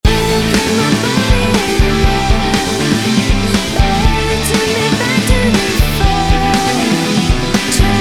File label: Komplete Band